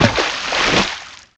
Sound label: splish; Falcon 40